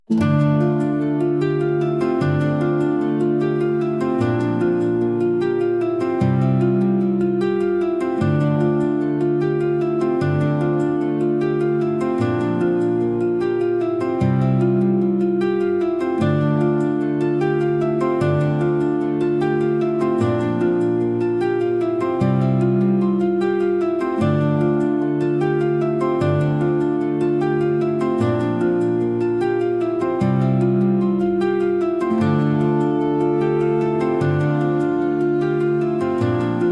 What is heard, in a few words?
calm